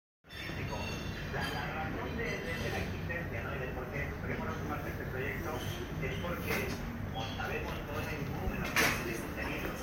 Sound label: Barcelona clothes shop radio